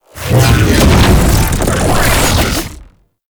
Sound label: Shield Drone Cast; Enemies; Abilities; Warframe; Tau; Dax Medic